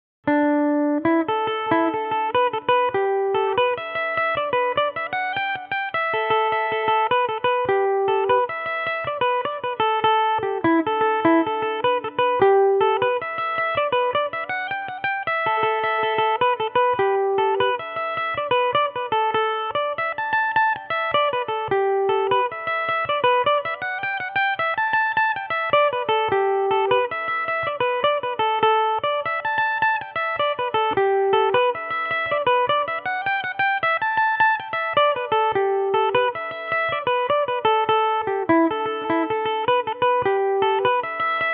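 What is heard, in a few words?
A dorian, Irish mandolin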